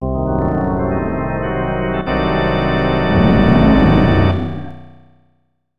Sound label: Tada; Heisei Shin Onigashima; snes